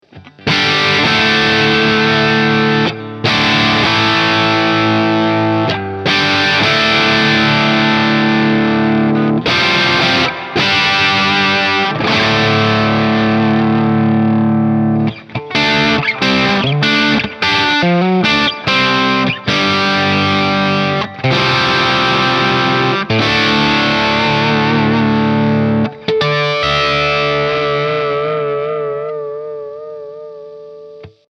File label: ECC83 FAT on max. Gain